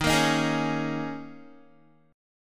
Eb 7th Suspended 4th, Guitar; Eb7sus4; strummed

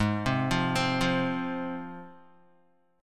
G sharp 6th G sharp down Guitar, chord; arpeggiated; JGuitar

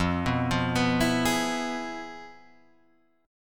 F Minor Major 7th Flat 5th

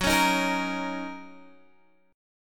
Fsharp 6th down Guitar Open G

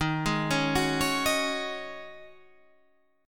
Db Suspended 2nd Suspended 4th Eb

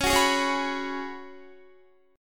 Db 7th Suspended 4th Db down Guitar; strummed

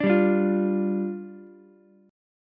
D Minor Double Flat 5th, down, Ukulele; Dmbb5; strummed; JGuitar

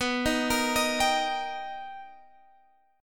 B Augmented Major 7th B down Guitar Standard; B+M7 arpeggiated; chord shape; JGuitar

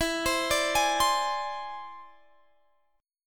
E Augmented 7th E down Guitar Standard; E+7 arpeggiated; capo=3

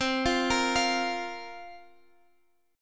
C 7th Suspended 4th, Guitar Half Step Down, chord

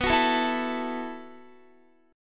B 7th Suspended 4th B down Guitar

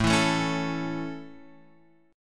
A Major A, Guitar Open F; strummed

